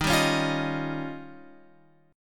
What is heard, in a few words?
D sharp 9th D sharp, guitar